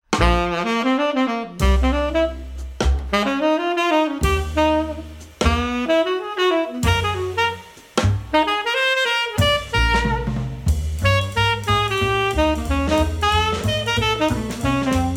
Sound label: Goofy Blues